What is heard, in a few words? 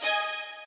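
violin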